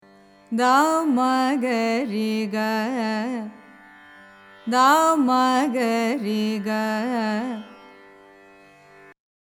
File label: abhogi, carnatic music